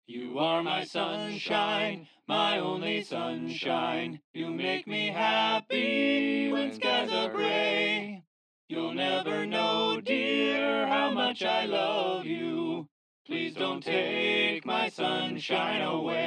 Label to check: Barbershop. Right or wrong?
Right